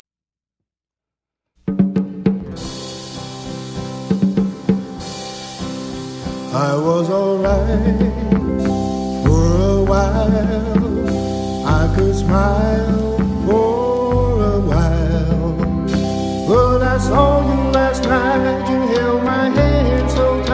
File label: Crying